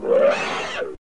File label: Alien Scream